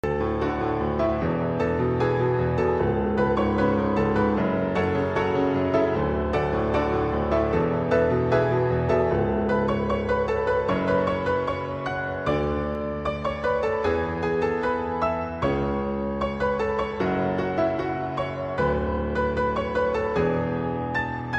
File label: Stunning Piano